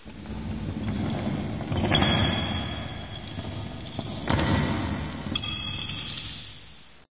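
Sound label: chainsaw ringing in space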